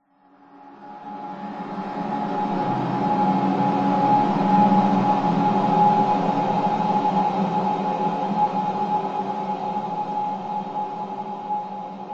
horror atmosphere swell dark discordant swarm fear chilling tense